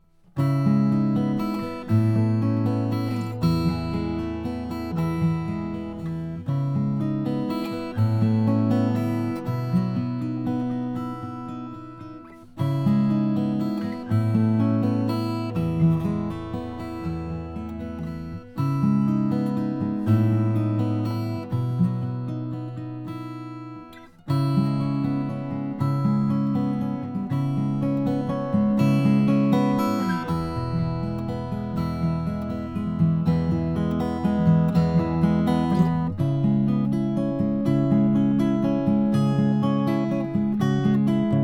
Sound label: mission gc brazilian rosewood